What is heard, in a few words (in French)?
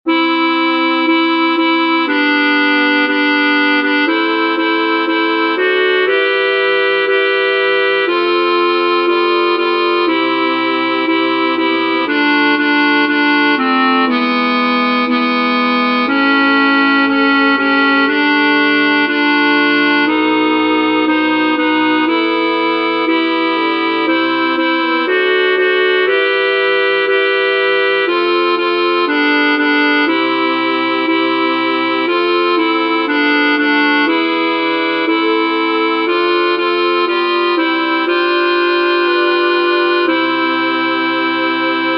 Signore delle cime (alte); Concert du 13 décembre 2024 en l'Église St Pierre - St Paul